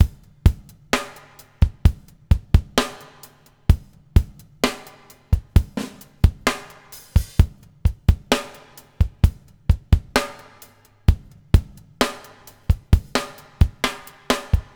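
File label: BUBBLE left; Spectrasonics - Backbeat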